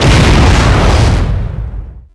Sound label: Large Exp, Explosions